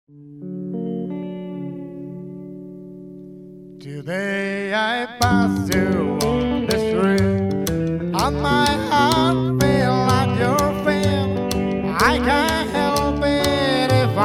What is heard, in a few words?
Rockabilly Duet